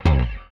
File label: Rickenbacker Bass; Airwindows; Rick-Xtras